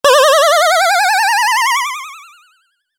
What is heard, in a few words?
ghost; 攻撃